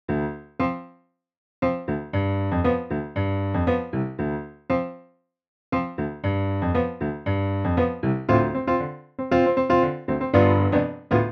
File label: funk; ai轉換; 將鋼琴音樂檔轉成midi檔的神奇工具pianotrans